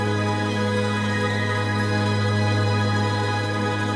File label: wheel Spin Mel; turn your fortune